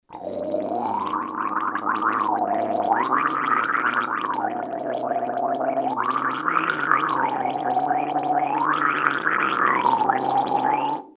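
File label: gargling sound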